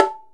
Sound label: Bongo Hi p; Digital Sound Factory Virtuoso